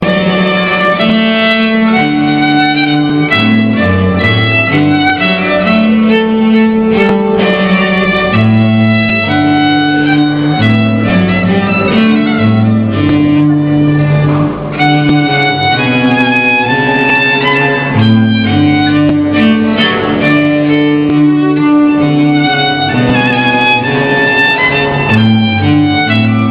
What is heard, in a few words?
TRUMPET VOLUNTARY, violin viola